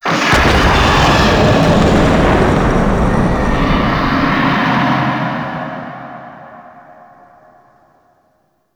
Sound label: Infested Hive Destroy Rumble; Gameplay; Warframe; Corpus Infested